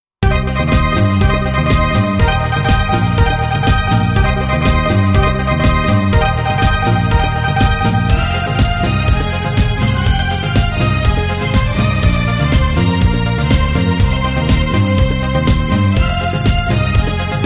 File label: brass minus